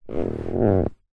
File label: vehicles car driver seat body movement creak